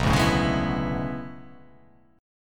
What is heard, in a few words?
B Minor 13th, chord; Bm13 strummed; x,2,0,2,1,3,1,1; JGuitar